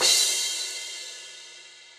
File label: Crash 17, Cymbal; Drums; Drum Hits; Standard Hits; Cop Style; Session Drums Studio